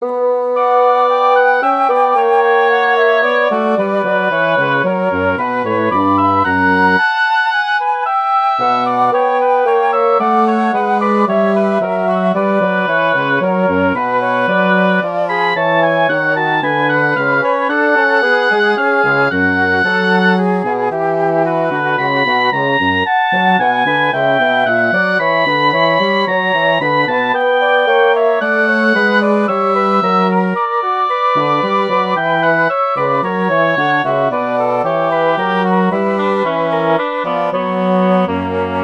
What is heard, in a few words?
for woodwind trio; Johann Sebastian Bach; sinfonia nach dir herr verlanget mich